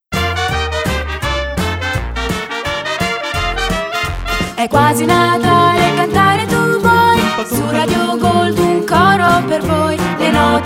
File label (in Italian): Jingle Natale; Radio Gold